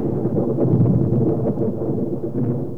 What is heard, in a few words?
Thunder; RPG